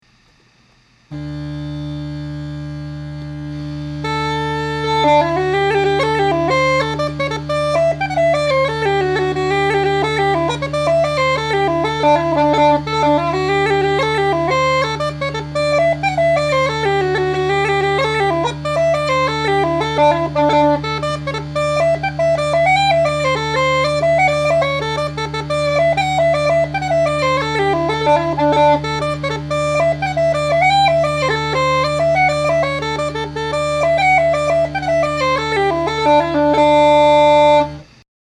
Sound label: jig